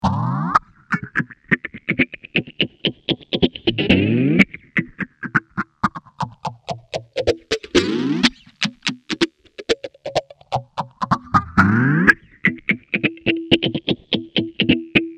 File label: Small Stone